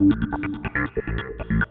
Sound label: TECHNO SYNTH 140; Keys